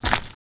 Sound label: walk gravel